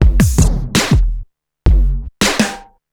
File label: drm, drums